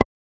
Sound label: sound of a bounced ball